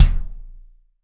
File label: BD, BD REAL